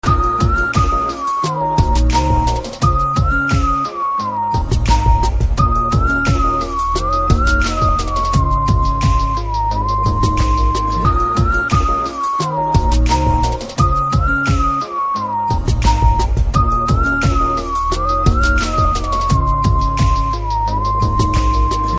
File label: BGM Whistle; Ayogya; Telugu